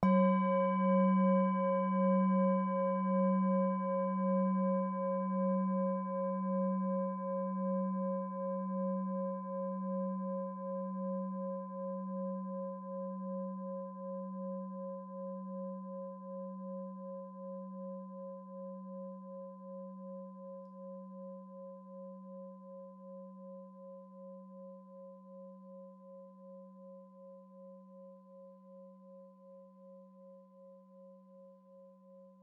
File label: Sound Bowl